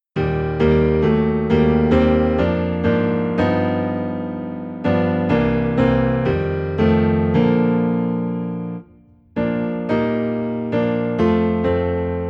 Piano Low in B Flat; Living for Jesus

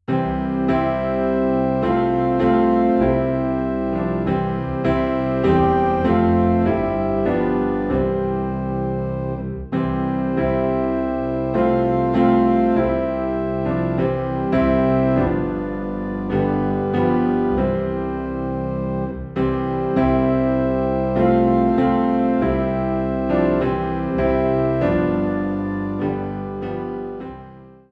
Piano and Organ Low in C; My Jesus I Love Thee

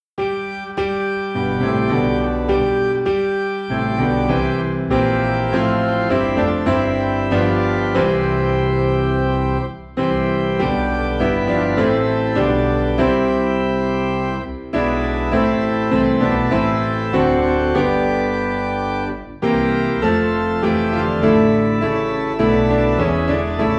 piano and organ low in C; Rejoice Ye Pure In Heart

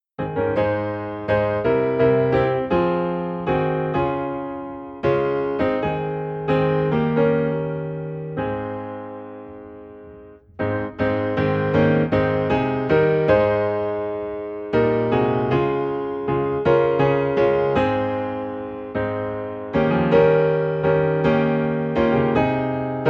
Piano in A Flat

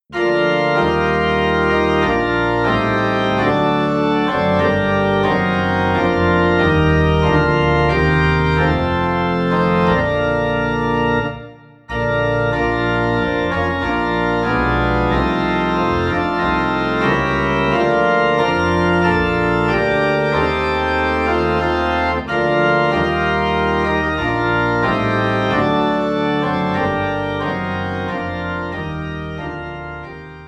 Organ Low in Bb; christmas; I Heard the Bells on Christmas Day

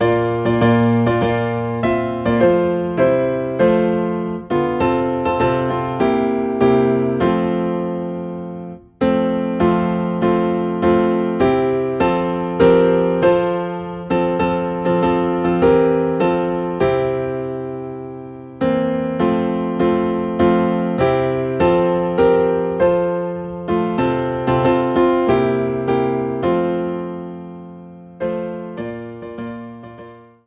Piano in F; A Shelter in the Time of Storm

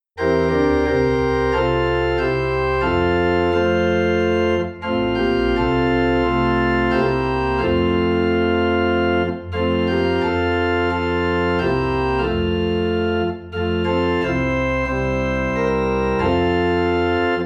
Organ in F; Trust and Obey

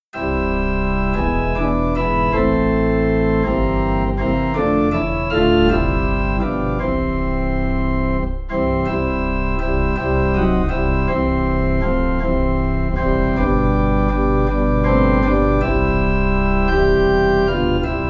Organ Low in C; Oh How I Love Jesus

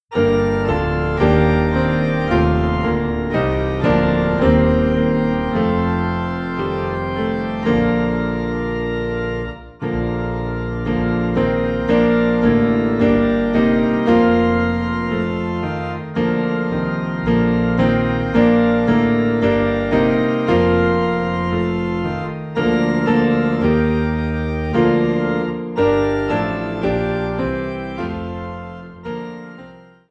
Piano and Organ Low in B Flat; All Creatures of our God and King

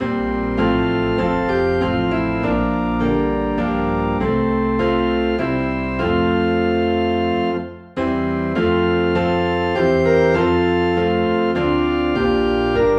Piano and Organ in F; The Master has Come

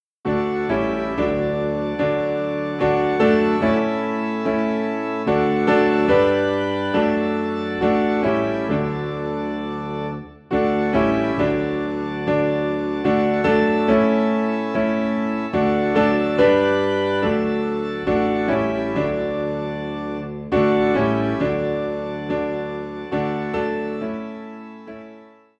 Piano and Organ in D; Come Thou Fount

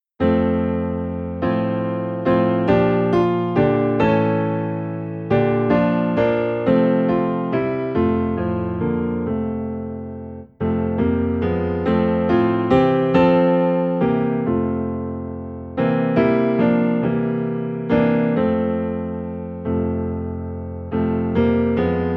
Piano Low in F

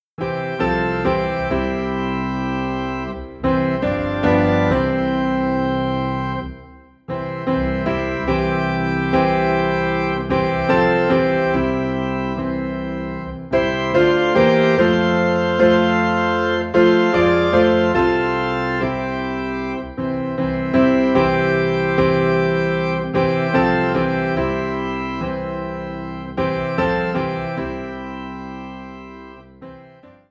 Piano and Organ in C; I Have Decided to Follow Jesus

